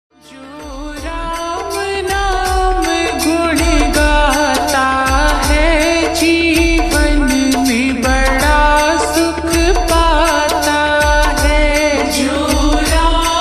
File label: Slowed Reverb Ringtone